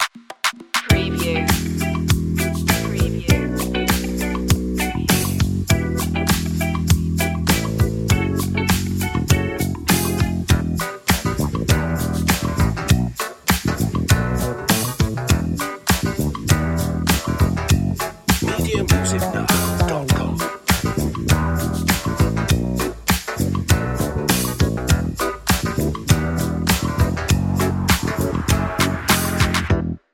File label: good vibe